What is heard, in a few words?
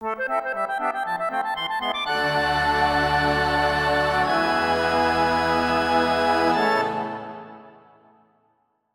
concertina reveal stinger